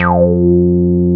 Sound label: SH101; OLD ROLNDS